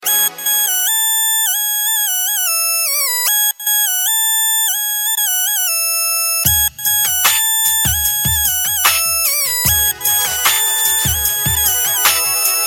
rock

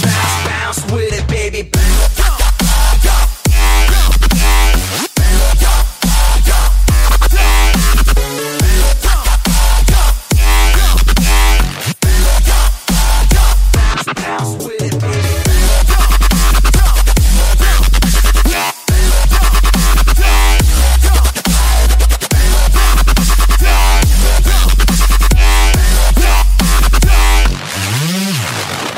BOUNCE DUBSTEP

